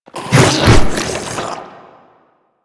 Infested Ancient Strike Flesh; Warframe

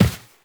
Step Default, Footsteps, Walk; Warframe; Tenno

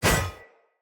Short Sword Whoosh; Weapons; Swords; Dendrit Short Sword

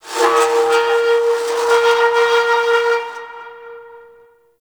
Asteroid Metal Groans And Scrapes; Ambience; Warframe; Grineer

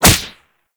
Whip Impact Default; melee; weapons; Oraxia

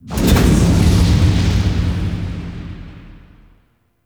Zariman Void Storm Hull Impact; Warframe; The New War; Ambience

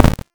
Proj Explosion; Warframe; Noctua

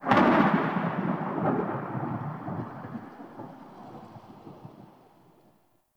Cloud Thunder; Warframe; Monkey King

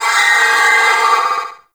Attack Long, Vocals; Warframe; Sentients; Sentient Trooper

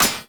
Short Sword Medium Impact Armour; Weapon Impacts; Player; Swords; Combat

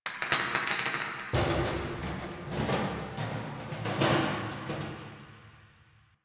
Subway Garbage Amb Misc; Nineteen Ninety Nine

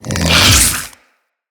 Infested Big Ashen Melee Swing F, enemies

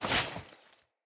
Foley Stun; Enemy; Sinecure; Banneret

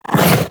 Companion Melee Swipe, Pets; Warframe; Characters; Zanuka; Foley

